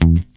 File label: GUI E2, E-GUI MUTED; Sound & Vision; Gigapack I CD 2